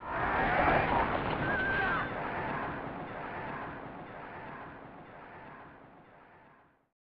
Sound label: Galloping; Shin Chou Kouki; SNES